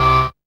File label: dance chord